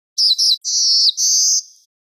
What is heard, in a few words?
Buzzy Rise Black throated Blue; Song Finder